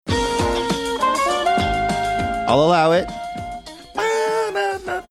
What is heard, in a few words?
singing along with theme; night court